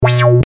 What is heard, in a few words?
Hardcor